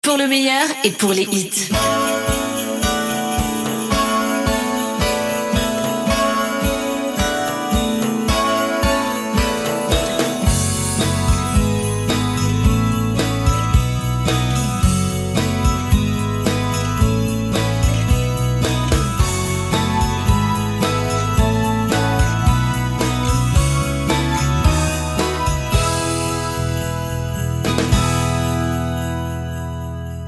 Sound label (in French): BED CLOCHETTES NOEL; PLEIN COEUR